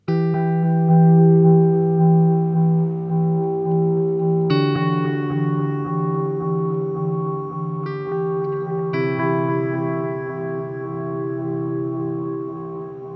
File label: Boss DM 101 Reflect